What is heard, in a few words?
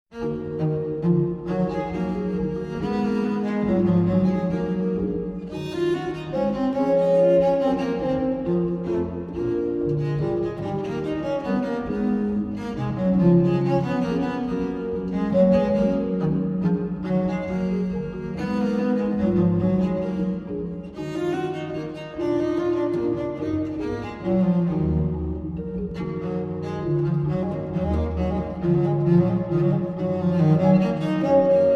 pipa